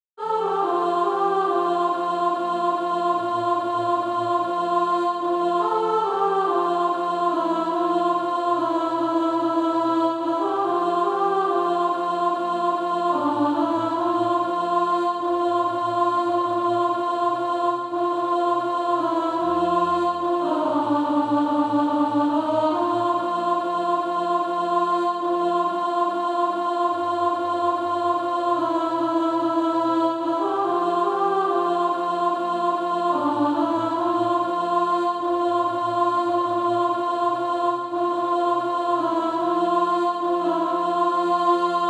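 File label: alto, Practise Music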